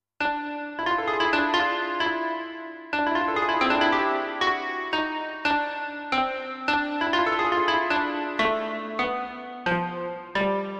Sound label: Kanoon